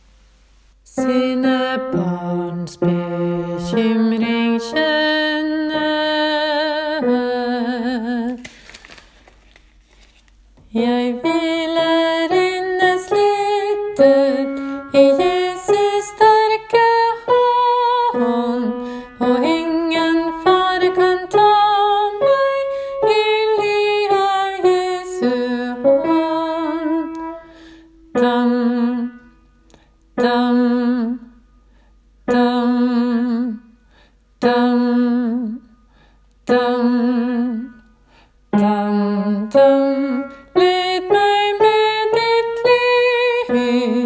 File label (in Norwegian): bass; ingen-er-sa-trygg midtdel; åfjord